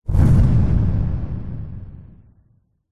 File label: explosions gas boom airy designed; zapsplat